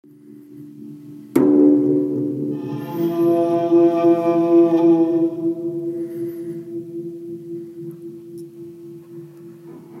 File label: Yaybahar